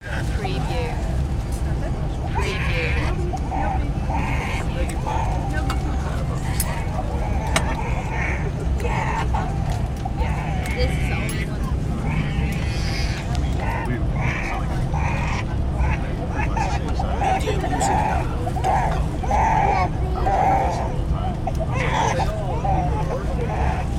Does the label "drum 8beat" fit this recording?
No